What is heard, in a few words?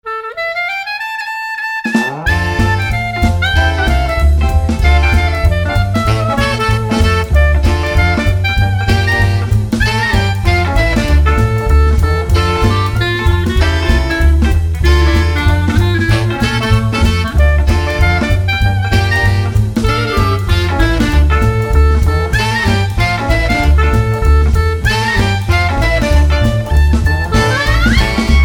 beat